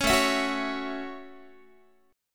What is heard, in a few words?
A 6th A Guitar, chord; A6 strummed; 16,17,19,16,17,x